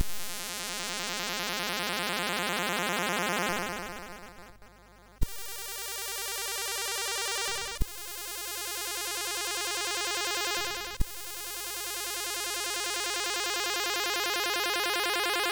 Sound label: Ambient, sid MOS6581R2; Mid Summer Feast Feeling